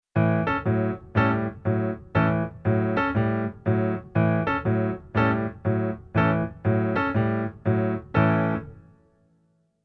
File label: Keyboard Pf Blues Pf